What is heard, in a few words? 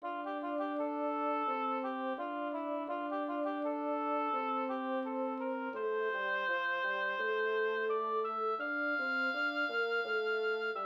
oboe and bassoon; Lent 2021 (Oboe & Bassoon)